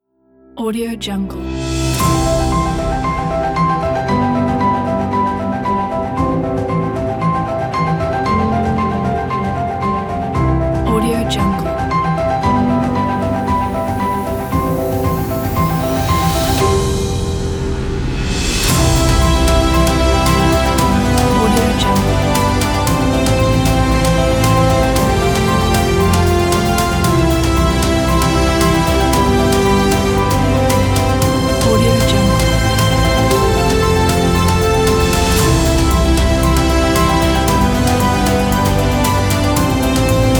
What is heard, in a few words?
inspiring uplifting piano cinematic strings